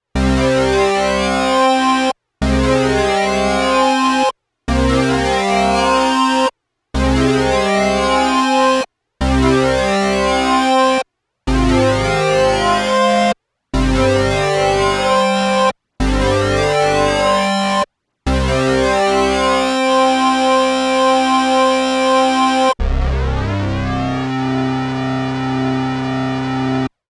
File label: Sync Sweep